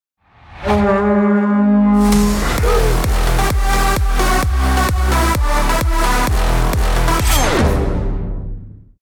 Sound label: music solo